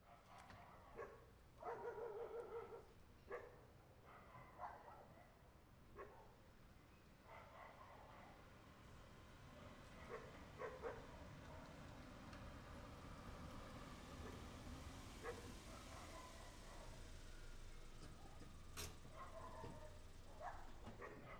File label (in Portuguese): Ambiencia Rua com Cachorros e Carro Estacionando